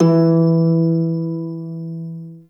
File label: Prime Shawzin Oct One E; Warframe